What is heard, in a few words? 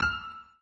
blink; Be Cool Scooby-Doo! - It's Dark Out There; ThrillGames